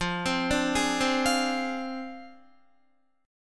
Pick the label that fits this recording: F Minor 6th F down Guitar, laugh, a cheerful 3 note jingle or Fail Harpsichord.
F Minor 6th F down Guitar